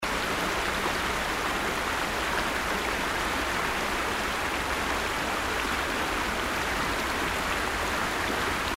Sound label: river